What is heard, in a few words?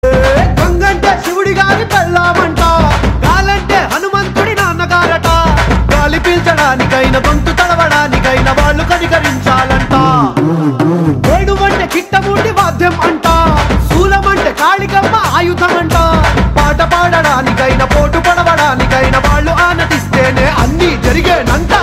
Ringtone